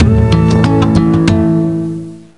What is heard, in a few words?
Egypt Win; Mystery Tales: Time Travel; NDS